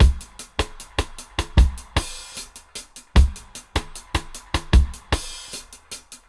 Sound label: 76 VRB, Dub, DRUM LOOPS, 076 BPM; Drumdrops In Dub VOL-1